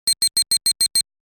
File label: Computer beeps digital menu beeping; sci-fi